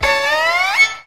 bendy, Stabs

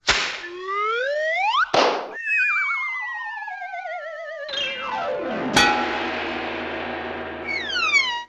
whistle up and down cartoon

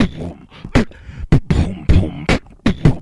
meeee, Beatbox, Drum loops

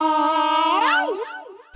"ahow"; Voice; ooh ahh

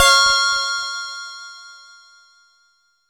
Bells

